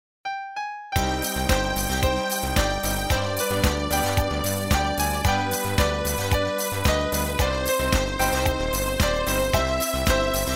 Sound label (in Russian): minusovka detskij sad